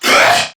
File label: Pain Crewmen; Warframe; CorpusCrewmen; CrewmanA